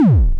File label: Serge Whoomp, Serge Modular drums